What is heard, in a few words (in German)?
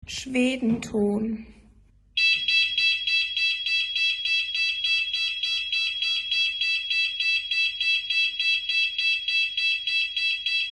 Ton 660 Hz on off